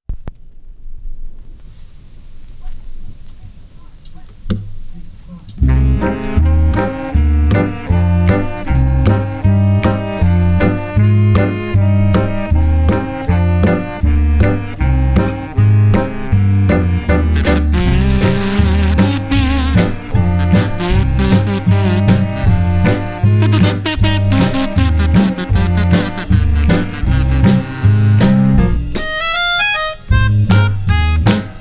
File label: instrumental; oeshiki